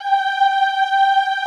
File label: OHH G4, choir; Best Service; Extended Classical Choir